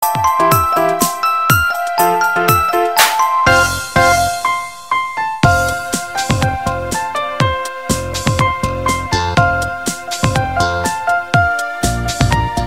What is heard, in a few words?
karaoke; an toan giao thong; CHUYÊN ĐỀ AN TOÀN GIAO THÔNG; Trường Tiểu Học Nguyễn Du